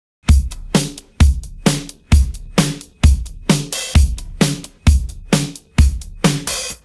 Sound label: pattern, drums